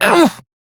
Pain, Male; Dialog; Warframe; Railjack Crew; Perrin Sequence

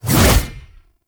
Lunge Impact Pre; Warframe; Oraxia

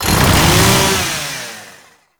Chain Saw Tonfa Accent Rev; Warframe; Grineer

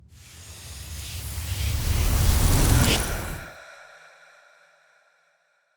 Water Rescue Transition Out; Soulplane

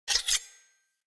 Dagger Equip; Weapons; Enemy; Daggers; Sinecure Dagger

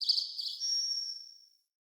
Bird Song; Galene Bird

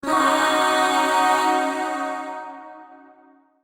Shawzin Yo Chord L; Warframe; Zariman; Void Shawzin; Chords

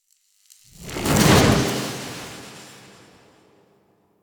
Dash; Parking Group; Volt; Warframe; Cinematics; Hub

